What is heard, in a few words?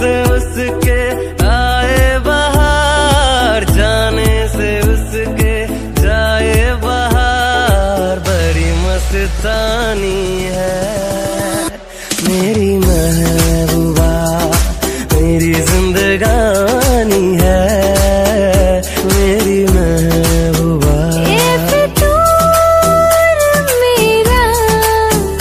love song sad ringtone, bollywood ringtones